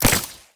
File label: penarrow